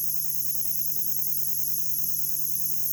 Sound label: noise